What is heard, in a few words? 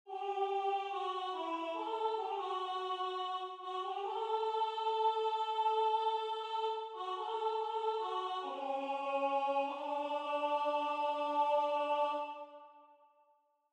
Tenor, barbershop